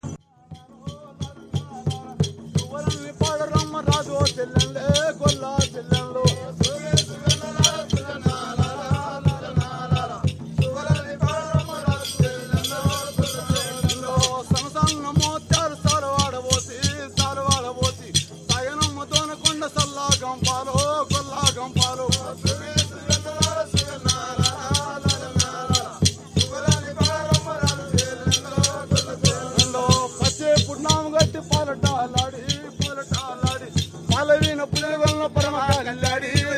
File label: Very Fast Whistle